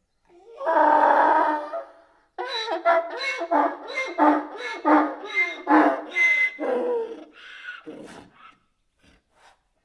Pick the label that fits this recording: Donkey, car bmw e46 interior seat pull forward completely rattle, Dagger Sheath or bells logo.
Donkey